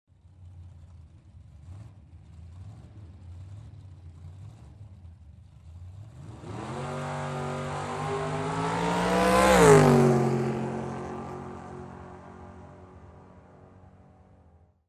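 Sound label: Sports car starts fast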